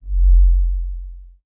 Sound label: Menu Low Tone; Menu FX